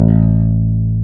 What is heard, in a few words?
BASSES, Roland